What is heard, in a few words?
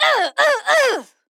Grunt Attack; paladins; Evie; Troublemaker